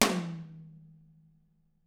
Drums HIT; DW; BRUSH

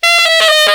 sax; East West; Global Sounds

